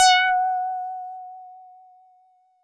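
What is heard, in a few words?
Mono Bass DX100 F#6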